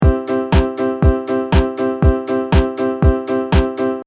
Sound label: piano short; logic